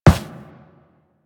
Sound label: Run Metal, Footsteps; Warframe; Operator